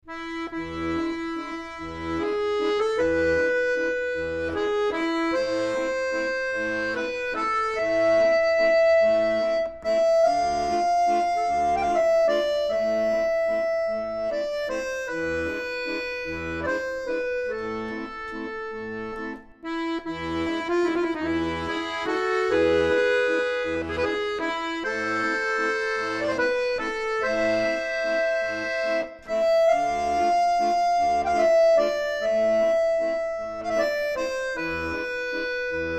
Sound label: Waltz; wedding music